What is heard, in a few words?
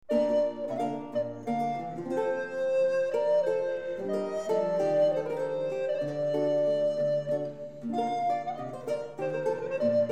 Dowland Gaillard